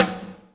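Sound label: LUDWIG SNARE, ACOUSTIC KIT; Greytsounds VOL-1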